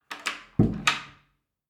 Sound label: Closet Door Close